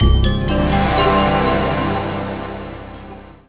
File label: win